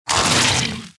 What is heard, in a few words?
boss death